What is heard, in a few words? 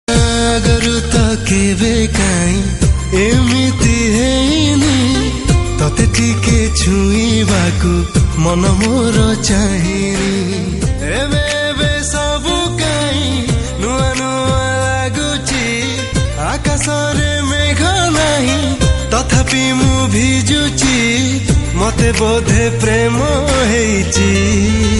odia song